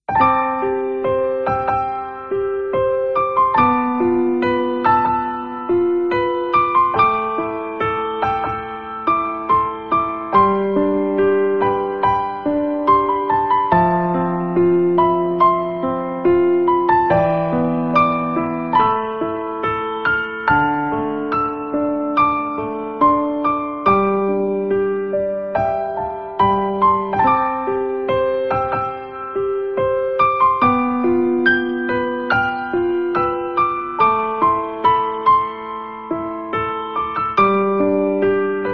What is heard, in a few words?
bgm dramatic; My Maid Girlfriend Romance You Choose; android